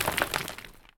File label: dirt; ice age scrats nutty adventure